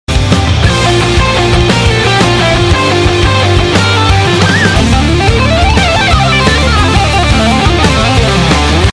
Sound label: tecnohard